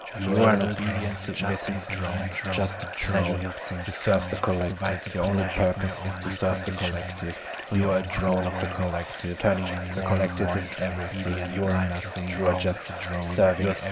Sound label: Drone loop, hypnosis